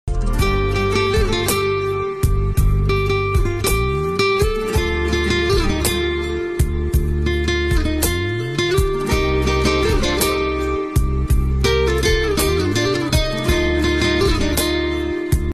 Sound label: Turkish mobile ringtone